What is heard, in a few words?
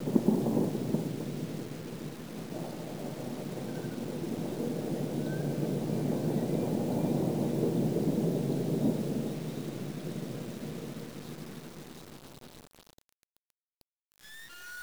thunder, ambience